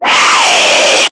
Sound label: Screamer Pain; Warframe; Lasrian